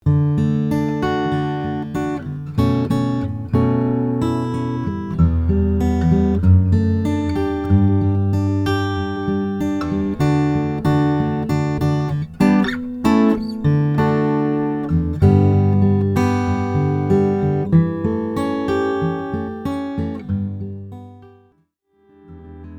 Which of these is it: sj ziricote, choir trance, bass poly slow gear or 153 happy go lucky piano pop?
sj ziricote